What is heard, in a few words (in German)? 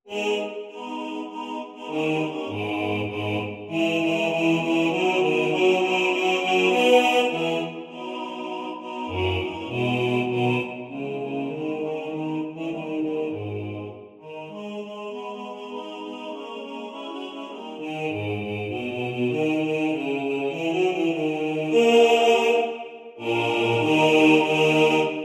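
Bass2 im Vordergrund, Männerchor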